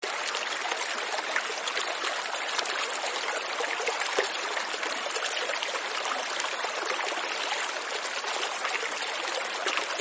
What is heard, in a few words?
river flowing waves phone tone